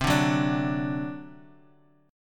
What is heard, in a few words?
C Suspended 2nd Flat 5th C down